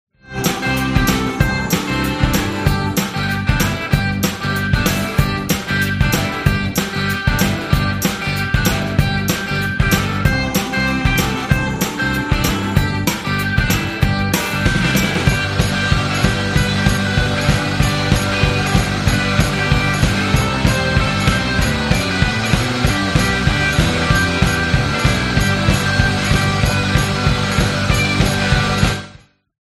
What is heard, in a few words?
dangerous guitars instrumental